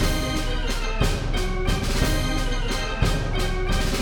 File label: Boss Fight Phase 2 MIXED; Ben 10; switch gamerip; Zombozo